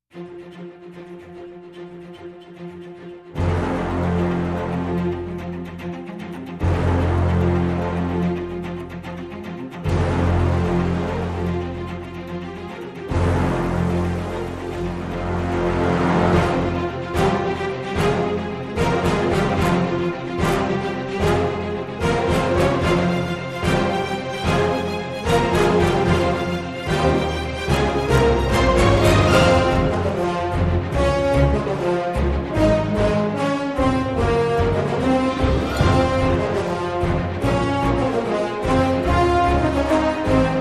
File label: Light Percussion and No Choir